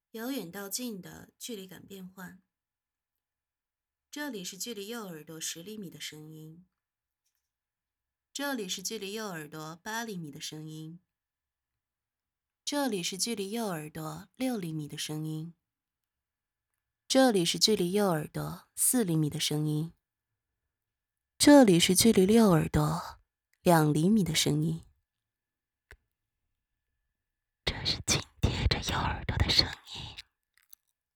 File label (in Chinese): 距离感变换（右耳）